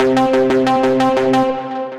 Sub Chamber Bassline; Synth Rhythmic; Ableton; Factory Packs; Synth Essentials

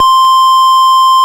Square C5; Ableton Foundation FreePacks; Foundation Glitch Toys & LoFi

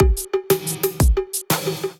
Kit, Drums; Momento Kit; Ableton; Drive and Glow

